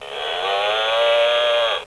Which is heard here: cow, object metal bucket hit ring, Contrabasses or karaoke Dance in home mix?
cow